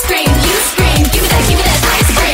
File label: ice scream